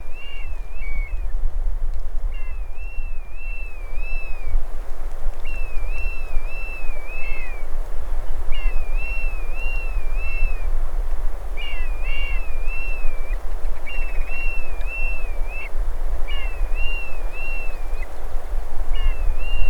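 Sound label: bristle-thighed curlew voice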